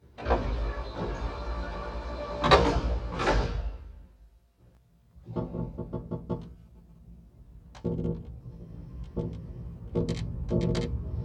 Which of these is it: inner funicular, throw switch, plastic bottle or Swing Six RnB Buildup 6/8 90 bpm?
inner funicular